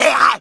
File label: pain, zombie; npc; cstrike